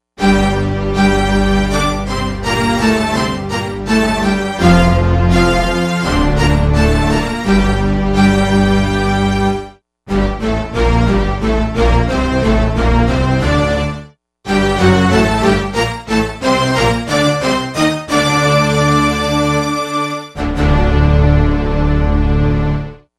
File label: Fast Tutti; Digital Synthesizer